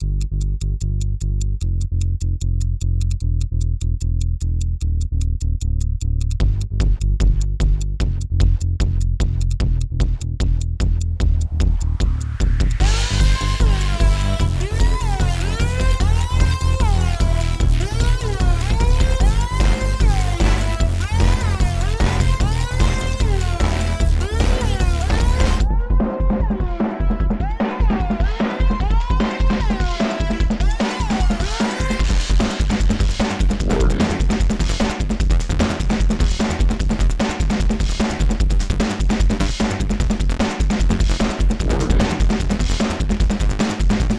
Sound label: countdown, music